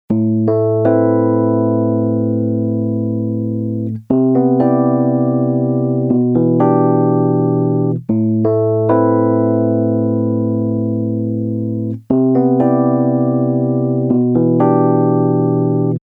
Portico 5043 rhodes plug wet; Rupert Neve Designs

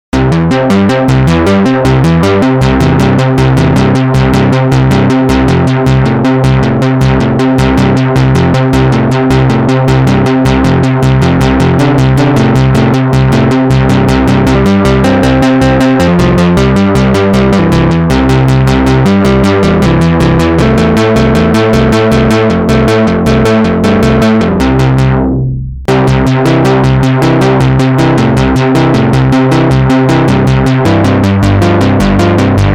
Arp DUO Test

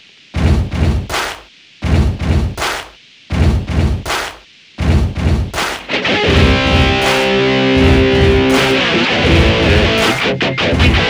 Vox AmPlug Rock You Style

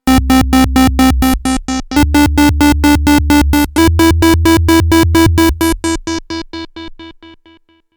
Roland SH-101

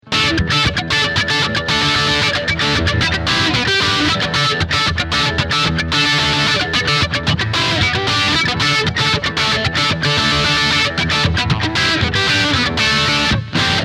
Classic Rock Crunch

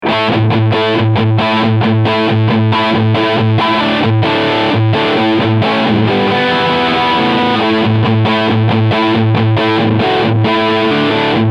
MXR Dookie Drive Blend Right Scoop OFF

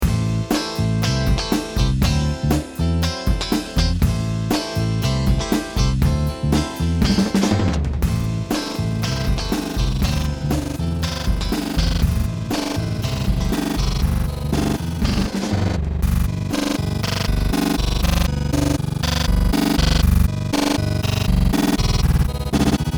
Funky

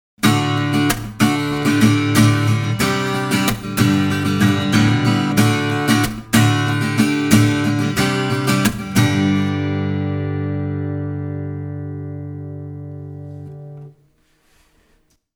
Strumming Neck

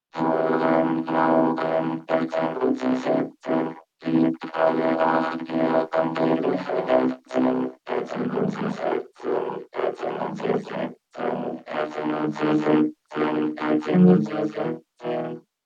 Vocoder Behringer VC340

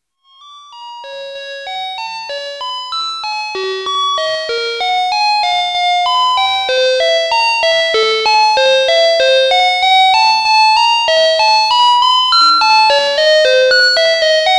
Sample Hold; Bullfrog

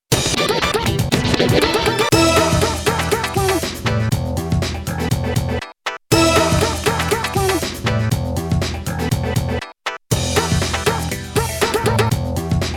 Casio XW PD1 Breakbeat

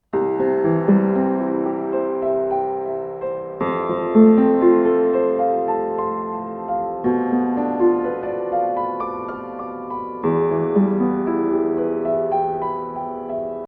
Piano; RME UFX

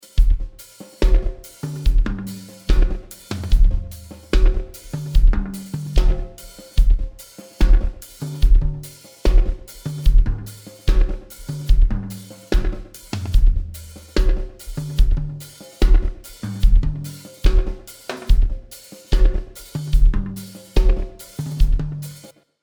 Dirty Delay Drums

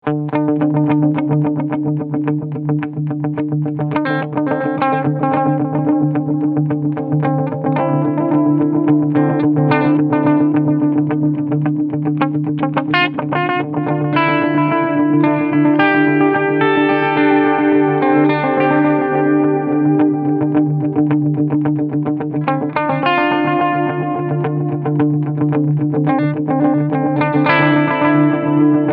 Catalinbread Echorec Style High Cut

